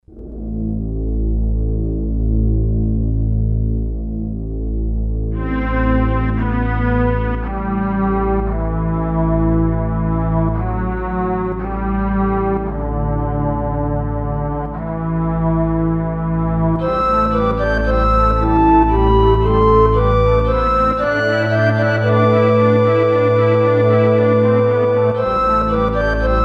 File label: mellow ambiance version